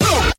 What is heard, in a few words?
uh STAB; ooh ahh